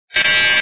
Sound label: fail